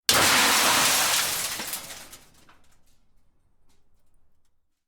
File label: glass pieces on metal